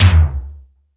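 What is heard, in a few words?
tom, funk kits; Crypton - DrumBase5000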